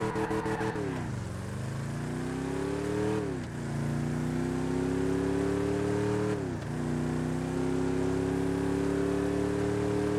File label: muscle v8, vehicle sounds; engine sounds; phoenix,ruiner,nightshade,vigero; FiveM